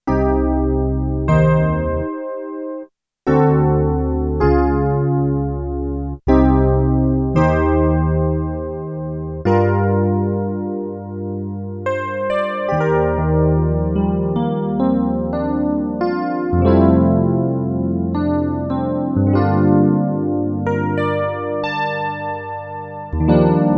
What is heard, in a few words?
E PIANO, Korg DW-8000; Factory Patches